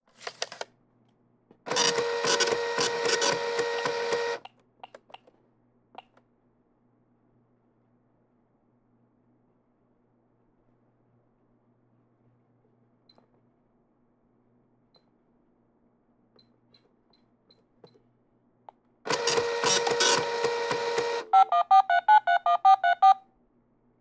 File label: Interac Machine Beeps Modem Dial Tear Paper Receipt